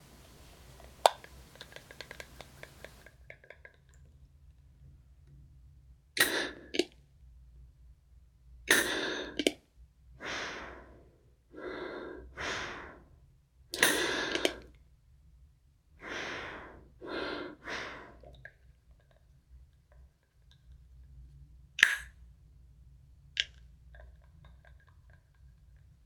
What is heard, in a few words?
Inhaler